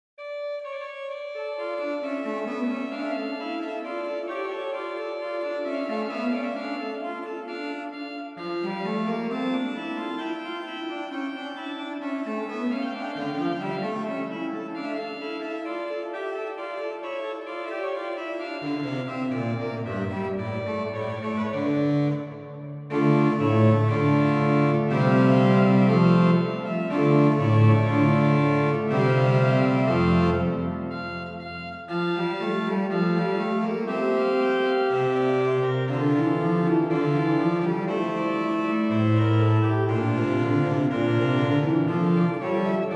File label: Messe Noel, OrganMusic; Fessy & Mine; Souvigny